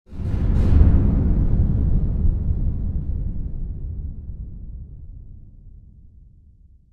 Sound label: Cinematic Doom; Sickness